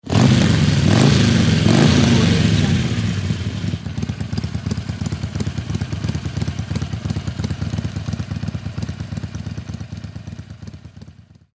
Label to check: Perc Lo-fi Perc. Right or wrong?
Wrong